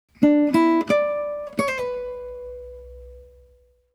Warp Flute, guitar; Legend of Zelda Series for Guitar; The Legend of Zelda

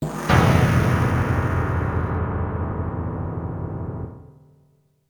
Game Over (SC-55); Fatal Fury 2; x68000

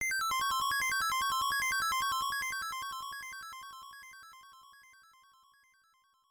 Switch Activated; super mario land 3 wario land

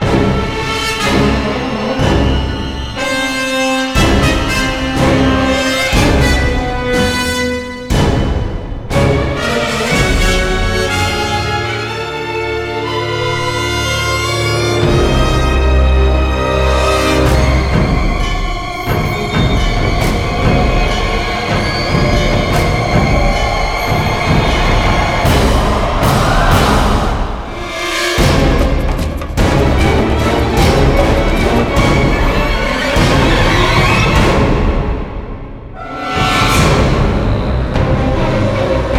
Techno; BOSS; Star Wars The Clone Wars Republic Heroes 2009 Wii gamerip

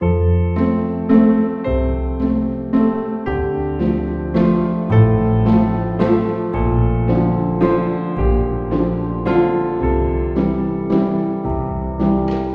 Chill Waltz; Hayfever; ps4; windows; xbox one

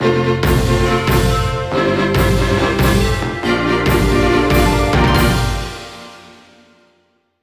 Jingle Battle

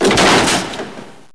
break wood

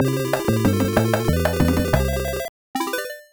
Area Clear; Chuck Rock II Son of Chuck; Genesis

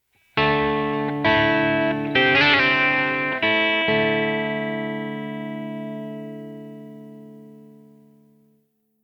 Lose; Project Reality BF2 1.6

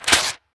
Ticket Rip; angry birds

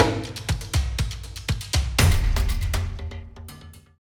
Transition; Skyway

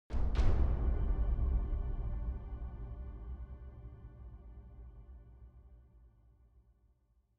Big Drums; Frozen Flame; windows; Citdel Big Drums

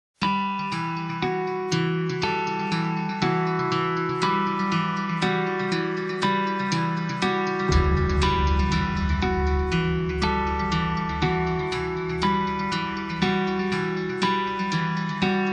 piano; Coolzpiano; No More Room In Hell; Windows

